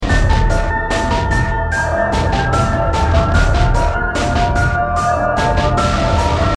win hum; cstrike; Resident Evil

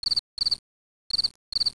crick

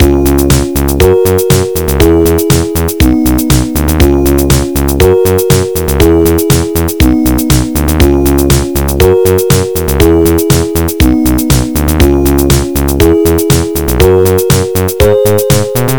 synth

